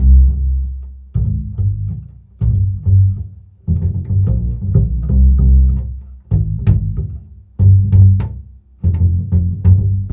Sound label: bassy tune